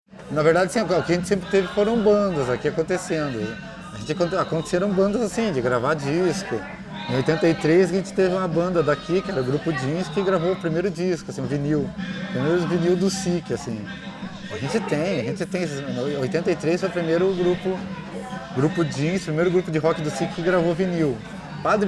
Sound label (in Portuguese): Musica; mapasonoro